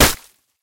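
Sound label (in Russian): выстрел с глушителем из винтовки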